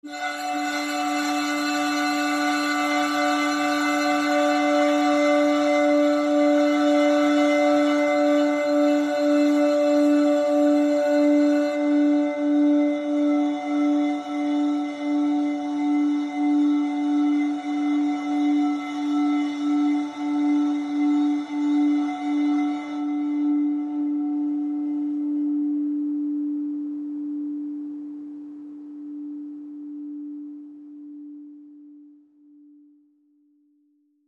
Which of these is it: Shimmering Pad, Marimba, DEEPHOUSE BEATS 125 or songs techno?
Shimmering Pad